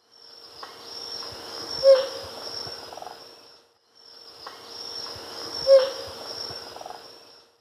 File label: Cotinga cayana, display song male; S. America; Eastern Lowlands